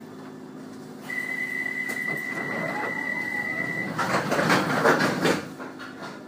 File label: stock doors